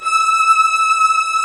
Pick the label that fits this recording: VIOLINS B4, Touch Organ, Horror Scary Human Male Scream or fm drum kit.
VIOLINS B4